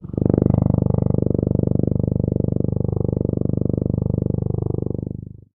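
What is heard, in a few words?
sounds of tuba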